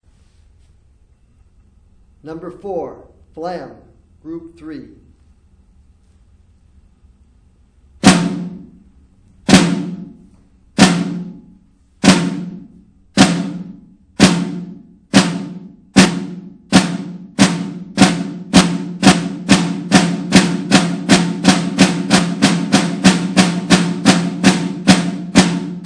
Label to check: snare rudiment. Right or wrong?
Right